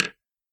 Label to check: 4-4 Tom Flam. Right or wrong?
Wrong